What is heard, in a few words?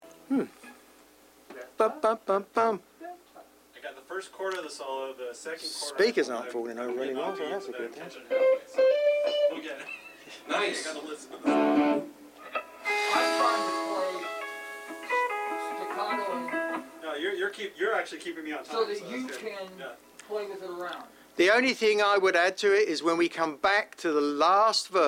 Background Chatter Mix